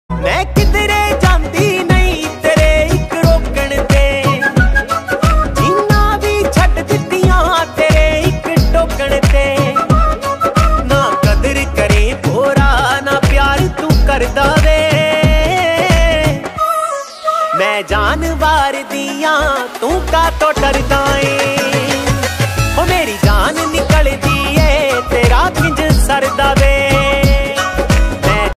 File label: Punjabi